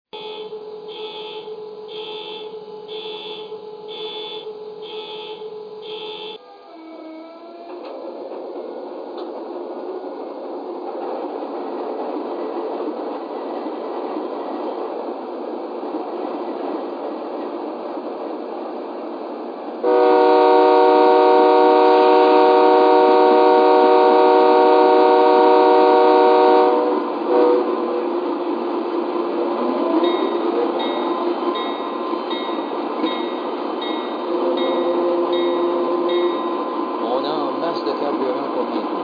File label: ac4400cw, trains